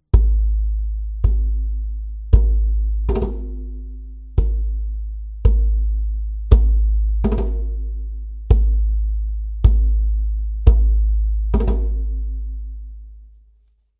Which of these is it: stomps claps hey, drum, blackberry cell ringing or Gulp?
drum